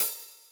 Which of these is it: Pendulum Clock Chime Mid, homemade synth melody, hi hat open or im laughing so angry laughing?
hi hat open